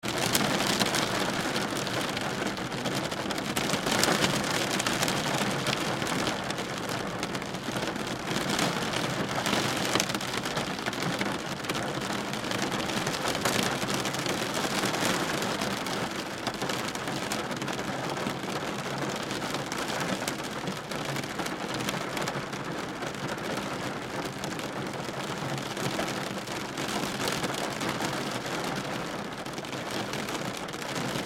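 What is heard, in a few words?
Rain interior Car Pouring Windshield; Windshield Perspective; Weather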